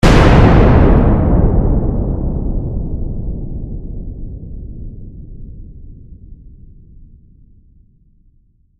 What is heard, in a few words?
Warfare, Explosion, Bomb, Blast; 5.1